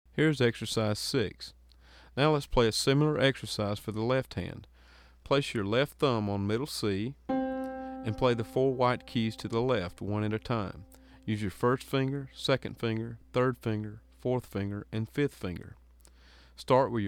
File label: Left Hand Exercise